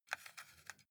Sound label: console controller xbox joystick move soft